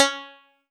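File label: OldBanjo DX100 C#4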